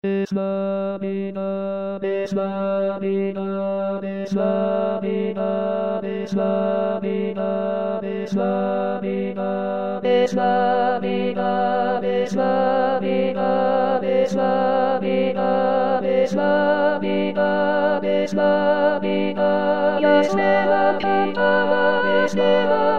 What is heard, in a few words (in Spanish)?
campanas navidad